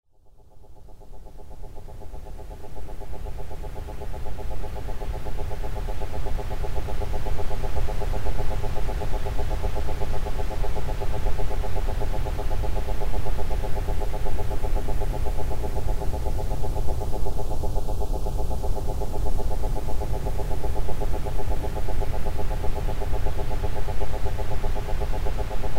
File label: drone solfeggio 8hz